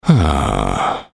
dude sigh; postal dude

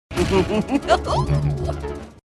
chrysalis laugh; mlp

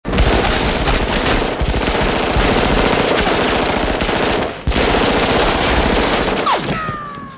uzi; hl2dm

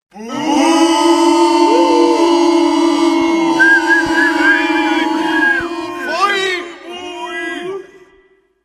booo; hl2dm; oppressive territory

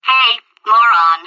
spawn; tf; redsun

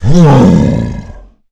melee; agrunt

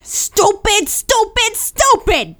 scout negative vocalization, fem scout

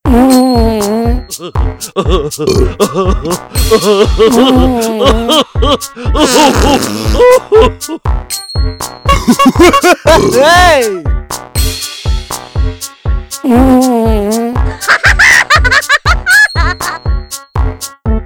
circus

